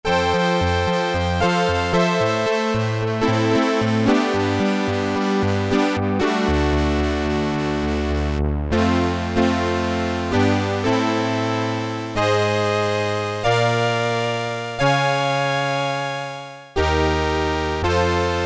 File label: Korg Poly-800